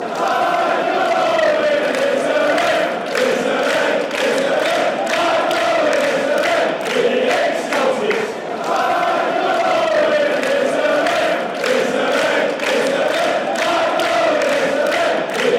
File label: chant; owen